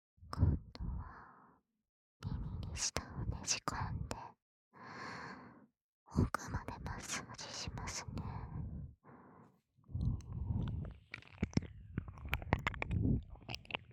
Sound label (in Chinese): 左 白耳 伸入舌头高耳压舔耳, 舔耳按摩, ASMR